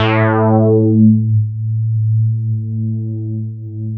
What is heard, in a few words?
Synths BASS